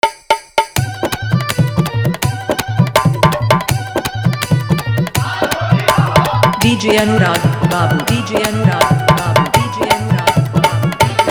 Bhojpuri Holi Dj Song Remix

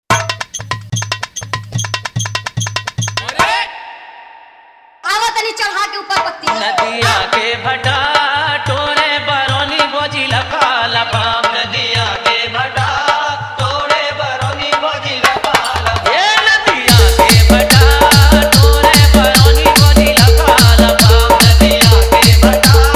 Old Song Bass Mix

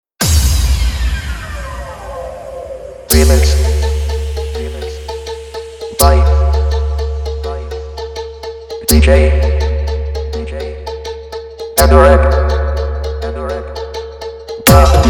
Hard Dhollki Dance Mix; Faadu Rod

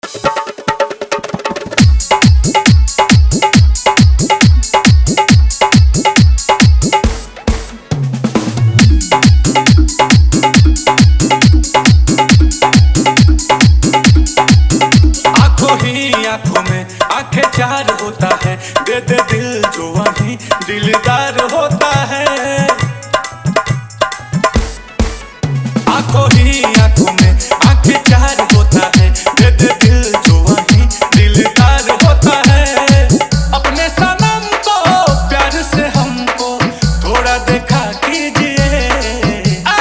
Bhojpuri Dj Remix

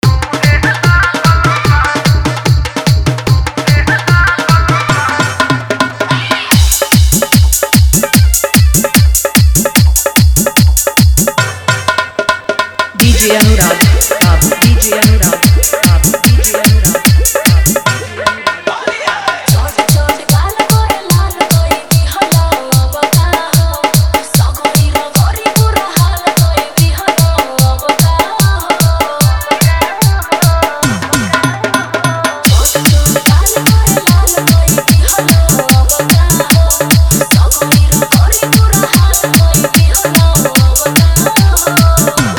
Holi Hard Bass Dance Mix

